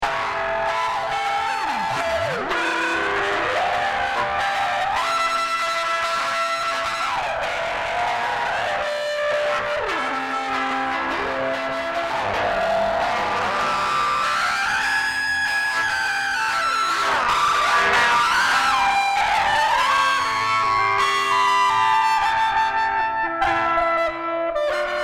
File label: guitar feedback solo